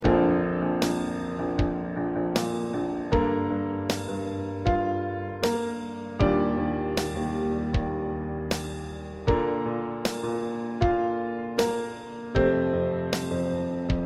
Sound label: Piano Drum